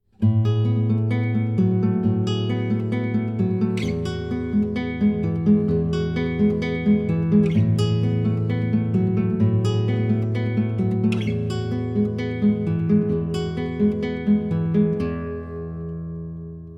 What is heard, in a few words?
Right Hand, guitar